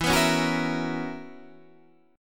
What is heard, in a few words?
B Major 7th Suspended 2nd Suspended 4th E, chord shape